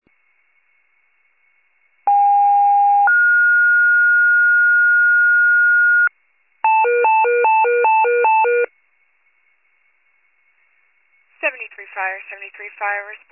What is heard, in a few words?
FIRE pager alert; 73 FIRE